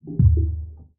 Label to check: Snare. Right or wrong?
Wrong